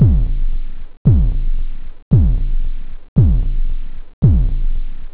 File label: boom, drums techno; OctaMED SoundStudio V1